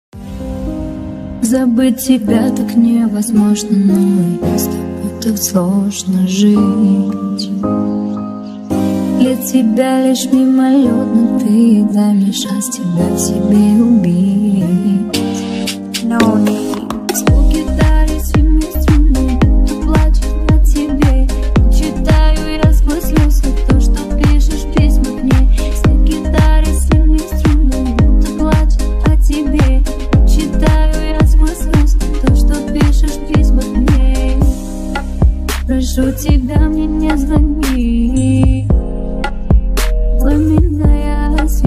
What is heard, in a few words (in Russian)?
zvuk gitary remix